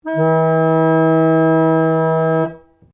ORGAN PUMP PRINCIPLE E3 OCTAVES, Old portable church organ; Keyboards (Sound Effects:Musical)